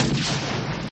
shotgun

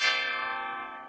ting

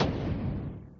add a credit; sfx

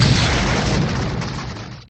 explosion; WILLIAMS; INDIANA JONES; Indiana Jones Original

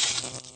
laser; BALLY

